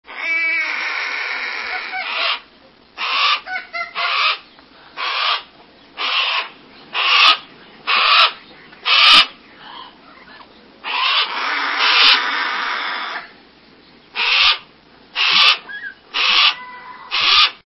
Harris Hawk

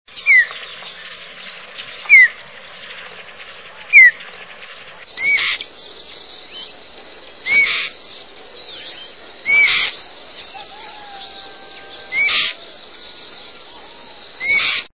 White tailed Kite

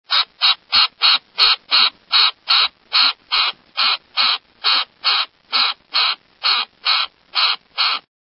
Wilson's Storm Petrel

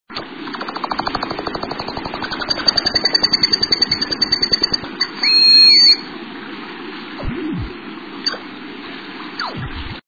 Pigeon Guillemot

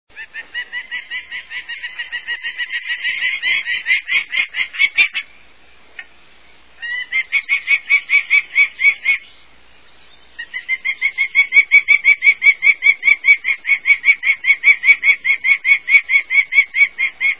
Prairie Falcon, songs

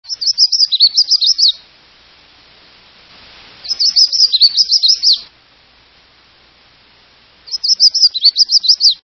Red faced Warbler, songs

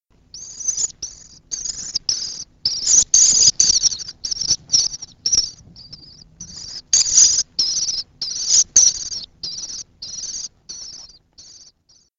Common Swift

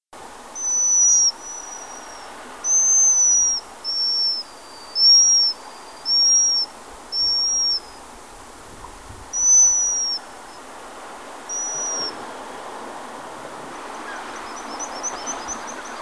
Black Guillemot

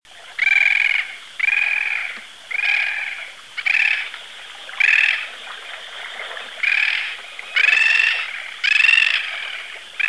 Bridled Tern

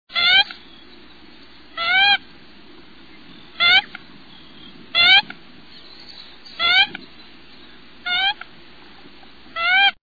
American Coot

